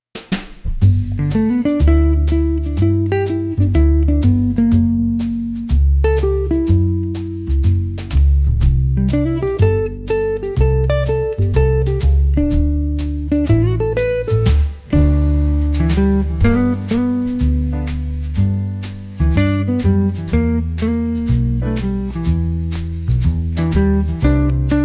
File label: Midnight Sax Stroll, smokey night jazz